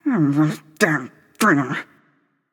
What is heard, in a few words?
nv grumble; smite; awilix